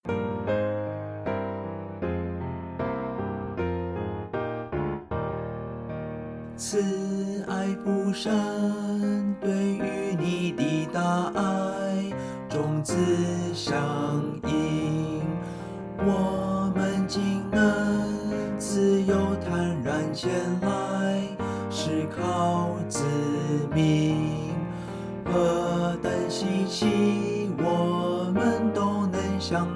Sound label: vocal; Hymns; Chinese